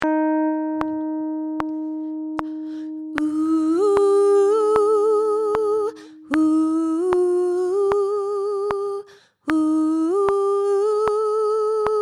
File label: gospel soprano; gospel silent night